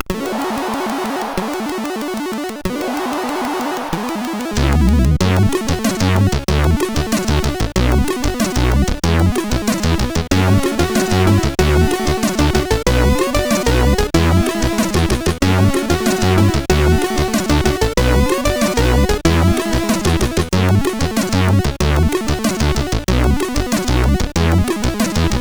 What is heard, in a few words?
Slowdown, sid, CSG8580R5